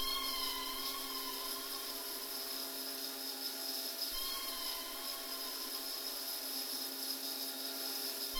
Lamp; Game rip; MiSide

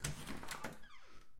Open Tumb Door; Game rip; MiSide

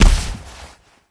Side Kick; MiSide

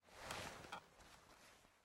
Player Sit Chair; MiSide

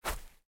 Step Grass; Game rip; MiSide

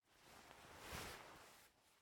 Player Move Sit; Game rip; MiSide

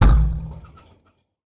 Ventilation Hit; Game rip; MiSide

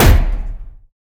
Door Hit Kill; MiSide

